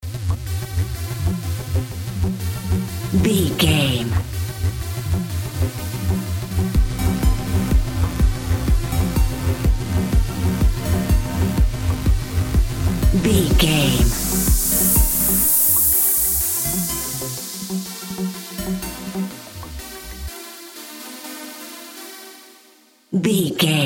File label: groovy modern edm, Groovy Modern Electronic; Groovy Modern Electronic 30 Sec; Catch 22 Music Network